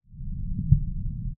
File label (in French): Contact Mic Tac Tac Tram; Petite Ceinture